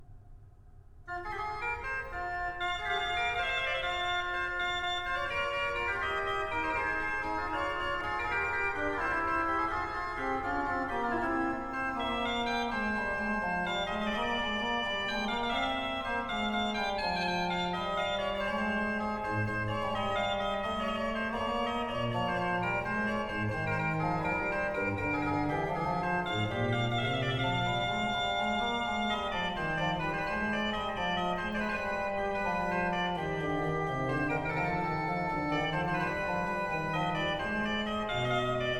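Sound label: Prelude in E Minor, Arranged for Organ; Olivier Vernet; Op. 28-4; Franz Liszt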